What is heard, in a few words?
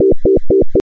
freq rect 4hz